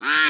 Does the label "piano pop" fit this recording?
No